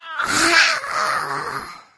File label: death, infected female; Left 4 Dead 2; Source Engine